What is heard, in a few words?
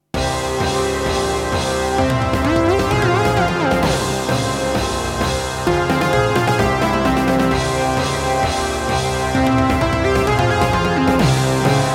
mini lead